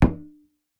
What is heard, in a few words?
sling metal, attack impact